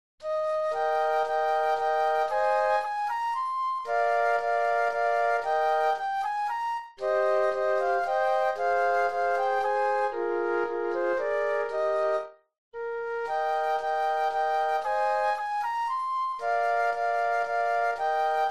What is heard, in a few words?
German Dance Flute Trio; classical; Beethoven; WoO 13 no. 9